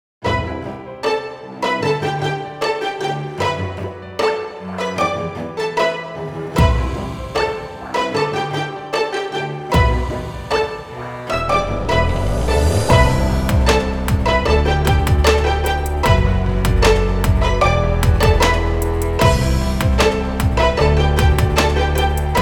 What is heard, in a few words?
ambient Electro 76